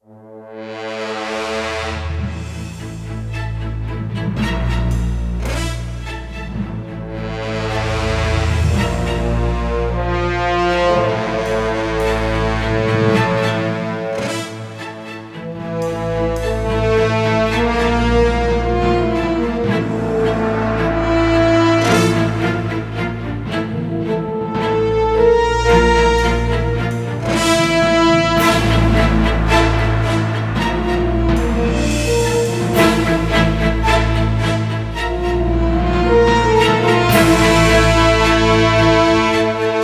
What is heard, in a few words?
Dangerous Theme TENSION LOOP